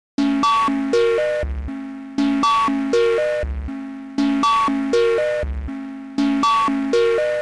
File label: perc seq 60 bpm